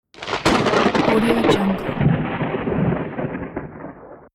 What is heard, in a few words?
thunder during storm